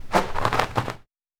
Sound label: Snow